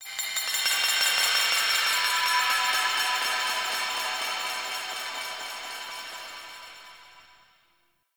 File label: Glassy Texture, Ambience; Warframe; Shadowgrapher